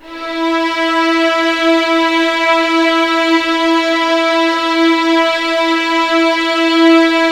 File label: E4 LEG FVL R, The Ultimate String Collection; East West